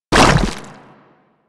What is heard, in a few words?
Nechro Mech Step Surface Goo; Step Surfaces